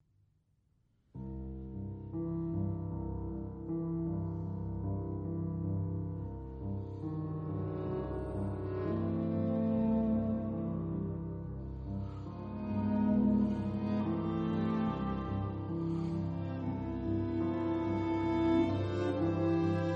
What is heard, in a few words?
Quatuor piano; Paris : Heugel, 1946; 4. Allegro assai